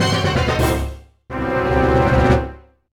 Mission Failed!

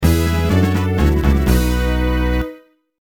Fanfare Level Up; Ranger

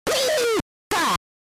Pikachu SFX; RBY

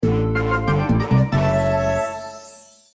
Jingle Clear; Micross; Picross

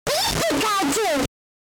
Pikachu SFX; RBY

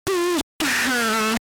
Pikachu SFX; RBY

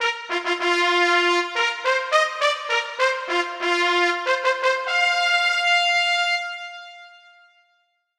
Player Fanfare; PBR

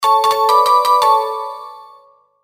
Fanfare - Item Received

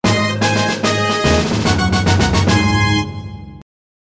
Fanfare - Success

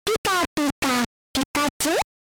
Pikachu SFX; RBY

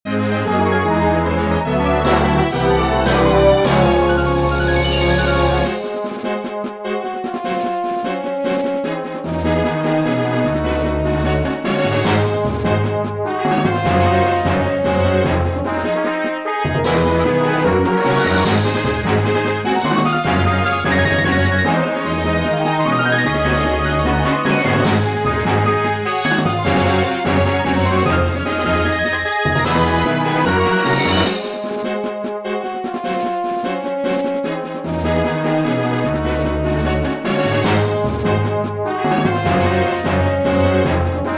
Battle Victory

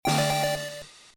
Evolution Jingle

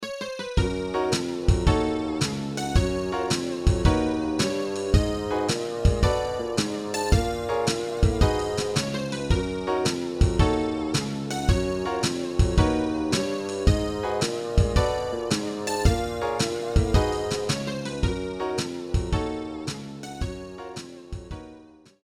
Lose; Dash

